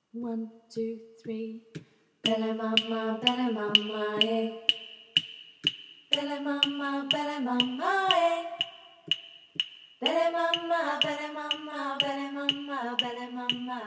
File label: Folk Choir music; Bele Mama